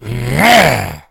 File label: attack; justzombies